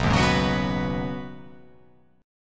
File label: B 6th Add 9th B, chord